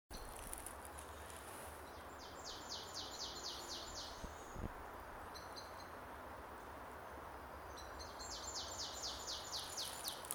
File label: Poecile palustris; SETE